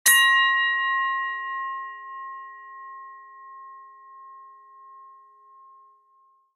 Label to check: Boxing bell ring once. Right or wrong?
Right